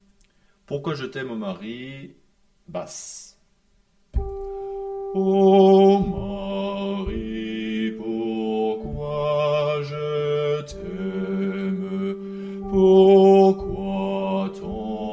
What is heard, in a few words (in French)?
basse